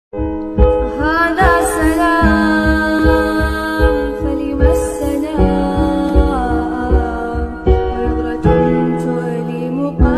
islamic ringtone; Palestine

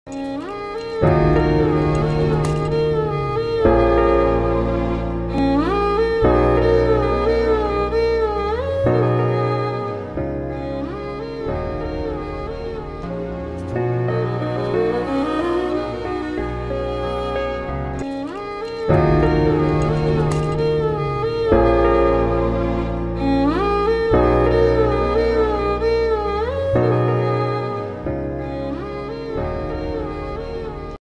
ringtone theme song; jersey

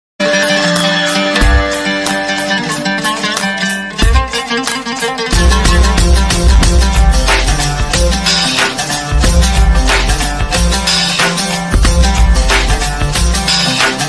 bgm; pudhupettai

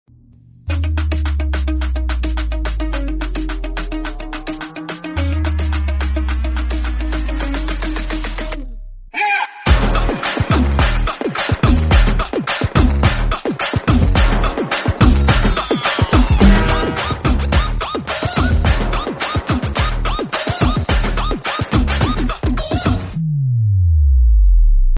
entry bgm, attitude bgm; Pagal movie; telugu

